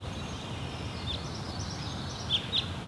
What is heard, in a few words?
bird loop part